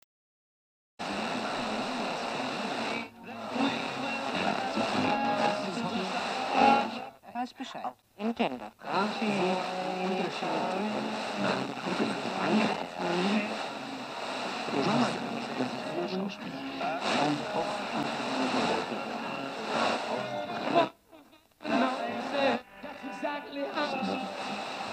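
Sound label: Radio static noise searching frequency changing station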